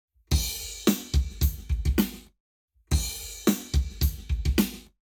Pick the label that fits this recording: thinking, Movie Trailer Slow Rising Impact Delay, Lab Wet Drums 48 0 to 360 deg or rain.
Lab Wet Drums 48 0 to 360 deg